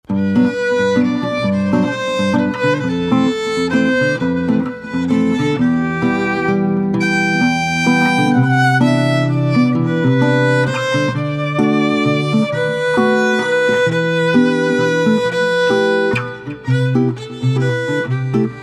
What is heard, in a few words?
Violin; Final Fantasy 4